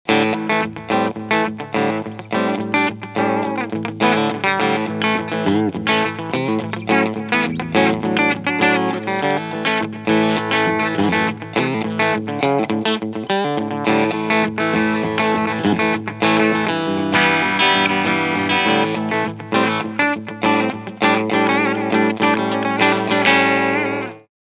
Fender Vintera 50s Telecaster Cleansound Steg Pickup

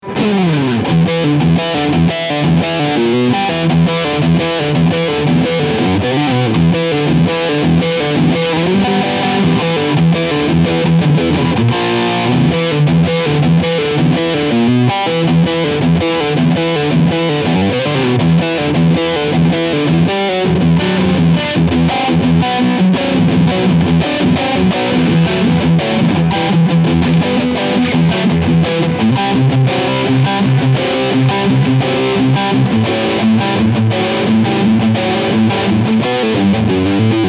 SC Custom Plus EMG TBF Riff Sound Steg PU; Harley Benton

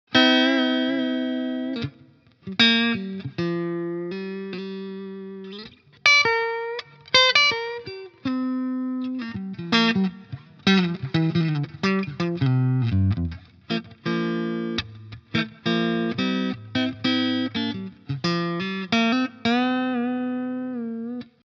clean Stellung 4; Charvel Pro-Mod DK24 HH FR

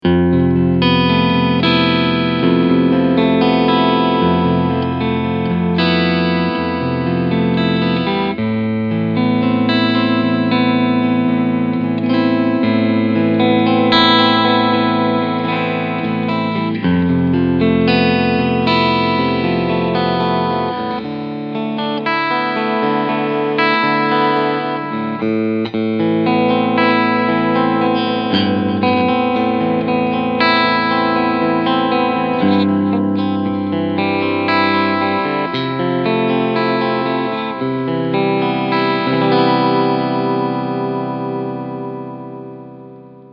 Schecter C-1 Apocalypse E-Gitarre Cleansound beide PUs SC; Red Reign

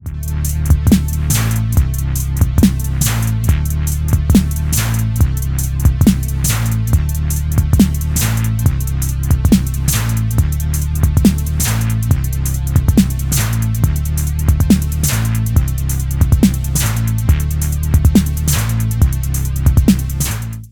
Roland SBX 1 Shuffle 50 bis 0

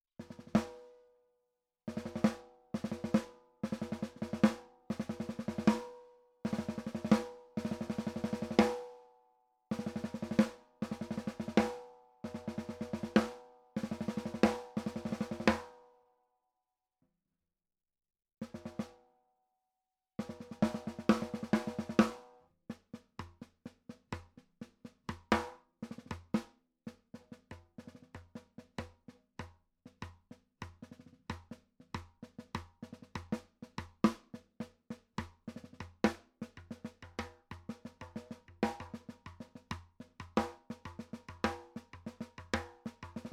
Gretsch Snare Hohe Stimmung

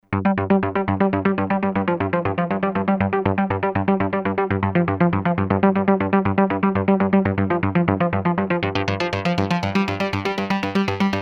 Arpeggio mit Filterfahrt

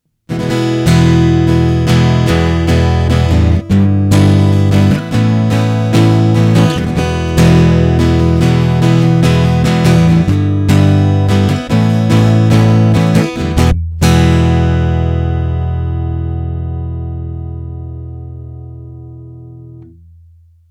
Harley Benton TravelMate E Pickup Strumming Volume voll auf

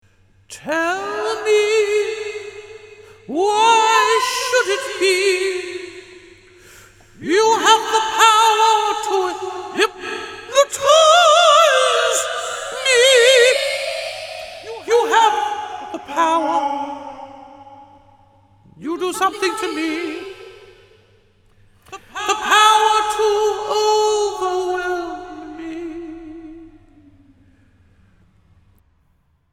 Gesang Pitch Shifting Reverb; LXP-5

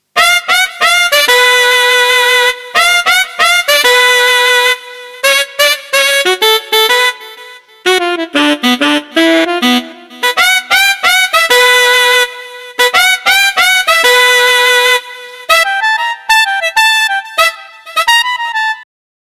Saxophon

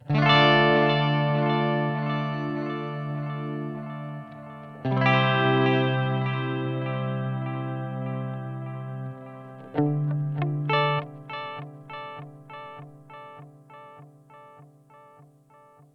Delay mit Pan; TC Electronic 2290 P

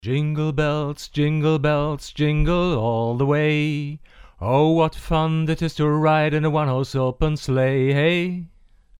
tbone rb770 gesang

